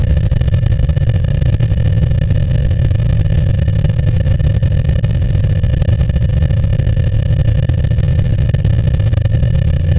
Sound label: create an 8s suspense loo, slot machine